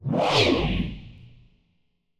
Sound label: Spaceship FX